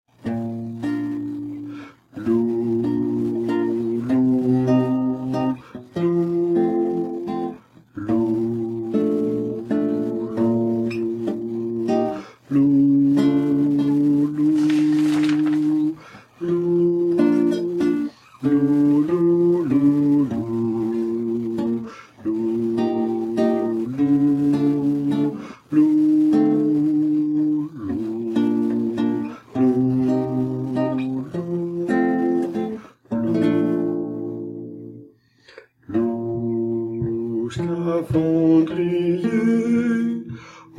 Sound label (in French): scaphandrier basse, chorale